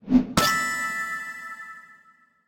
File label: random Wilds Fall Down; finn